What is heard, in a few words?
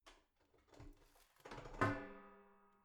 garbage can open lid soft hit wall